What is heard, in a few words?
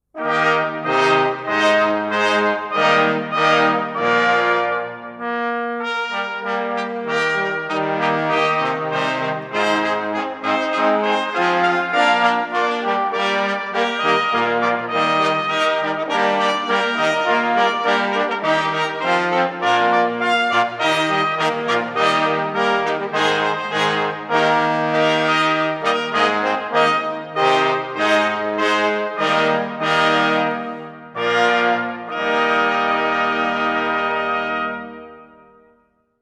fanfare; maribor